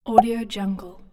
mouth pop

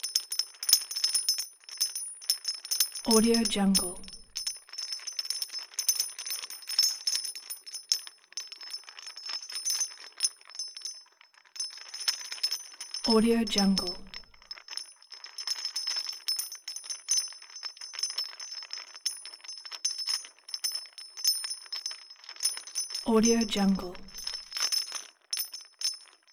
pitched just chains rattling; soundbits-soundfx

